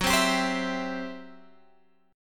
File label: C Major F sharp